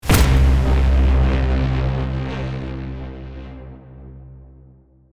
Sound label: someone was eliminated